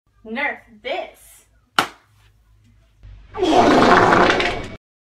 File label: Disgusting fart